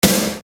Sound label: Snare Dr